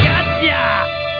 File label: pain